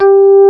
Hrm Flan G3, GUIT2 AC; Best Service Gigapack 2

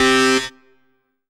RAVE, RAVESYN; Best Service; Dance Mega Jungle Rave

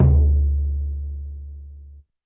Surdo Open, YAMAHA RM1X

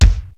NEW KIT DPM3, Peavey DPM; Rubber Chicken Software Sample Bank

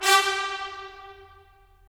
BRS, Tps Stacc; Roland - LA Composer vol. 4

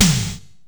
PERCO SNARE; E-MU Producer Series Vol. 2; More Studio Essentials; Composer

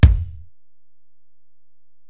Ac BD, Alesis DM-PRO; 300 Drum Machines

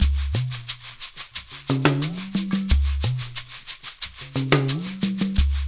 LOOP, Percussion; InVision Interactive; Percussion Slam; ZOMBIE

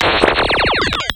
Laser Fast

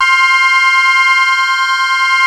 AQUA TARK, Synth

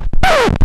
SKRATCH, TECHNO INDUS; Greytsounds VOL-1

